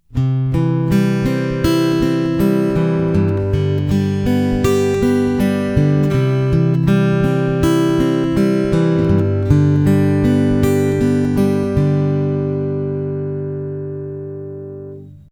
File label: easy fingerpick, acoustic guitar